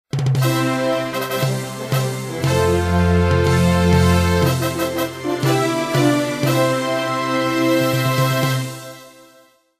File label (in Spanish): Fanfarrias, fanfares